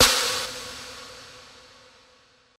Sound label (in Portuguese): Caixa